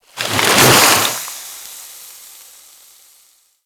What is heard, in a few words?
Infested Moa Slow Bomb Dissolve